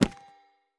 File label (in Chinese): 錾制书架取出附魔书声